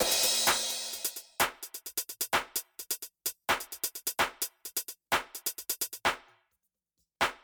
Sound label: f Drum Loop 129bpm